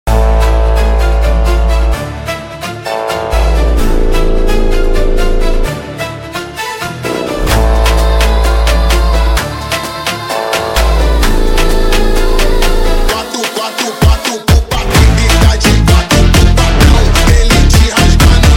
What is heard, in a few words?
funk sped up